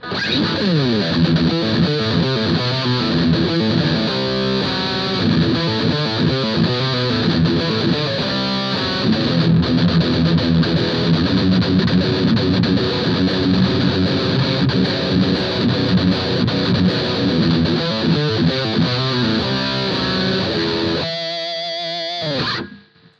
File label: Les Paul Extreme; Cube lt